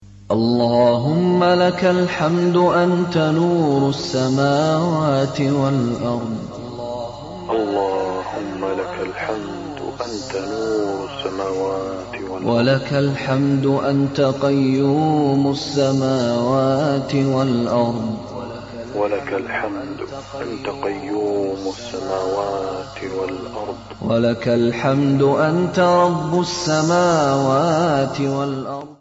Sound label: dua ringtone